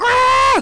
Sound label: wrrr